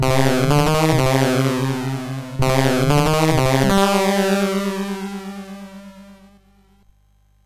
sid MOS6581R3; Garfield

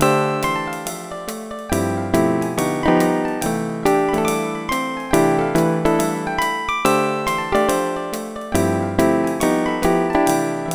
solo; Dr. T's MIDI Recording Studio

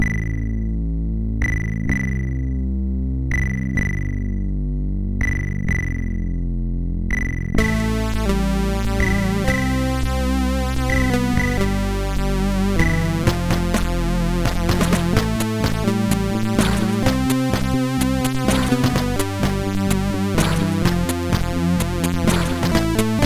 MOS6581; sid; MOS6581R4

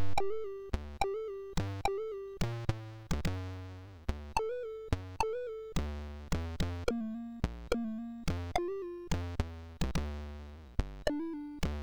sid MOS6581R3

